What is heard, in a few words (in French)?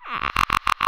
Toc Groc toc oc gratté